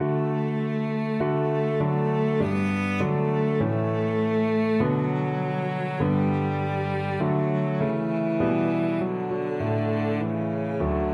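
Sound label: violoncello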